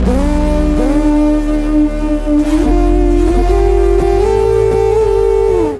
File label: Bass FX